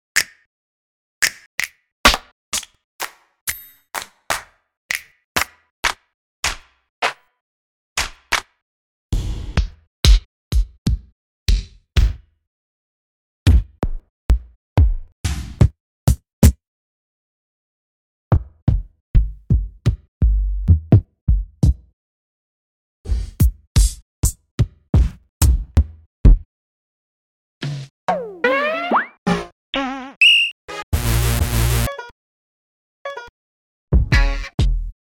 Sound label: kit